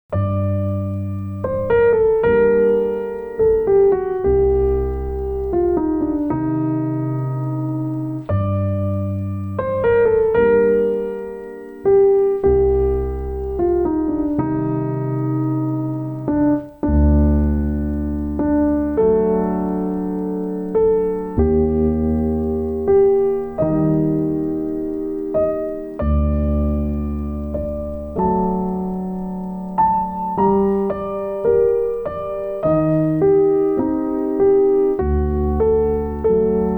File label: Dawn Over Empty Fields, piano, 60 bpm